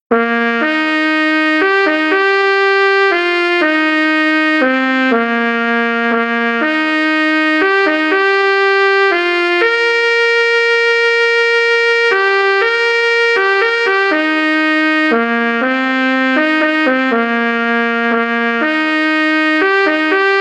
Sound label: Amazing Grace Trumpet sound